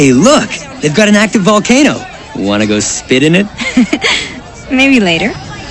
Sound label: spit